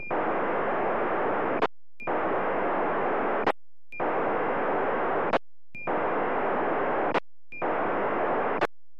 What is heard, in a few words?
D-STAR Audio